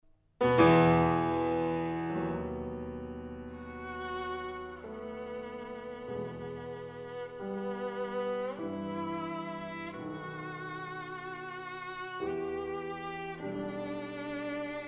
piano trio; goldmark

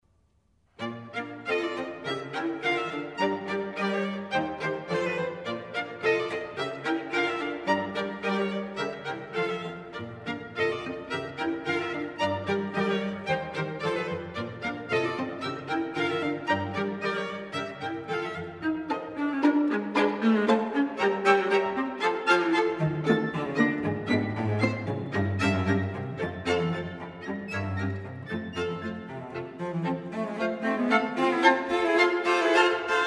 childrens polka; tchaikovsky

